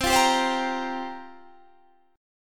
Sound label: C 7th Suspended 4th, Guitar, Standard; C7sus4 strummed